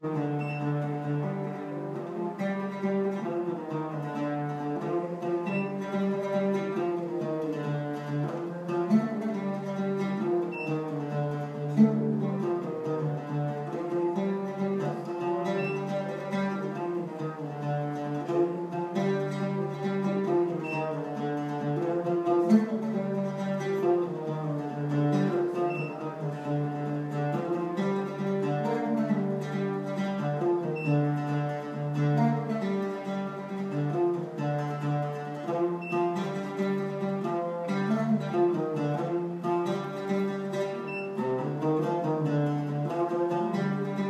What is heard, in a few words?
Oud Player; Aqaba